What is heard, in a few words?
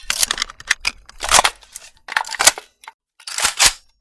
svds load, weapon; metrocraft